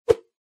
Motion Swish

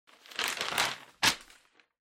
Flyer Flip

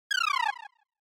SNES RPG Magic Status

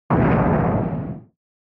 SNES Shooter Damage

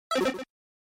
Treasure, NES RPG

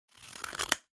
Scissors Cut Paper

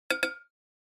Onoma Surprise Low

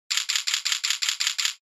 Camera Phone

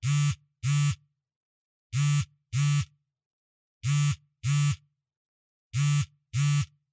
Cell Phone Vibration Cushion

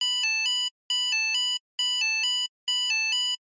Warning Alarm

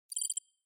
Cyber

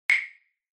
Hyoshigi

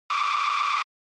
Tire Squeal; SNES; Racing

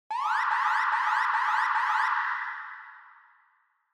Warning Siren Reverb High Mid

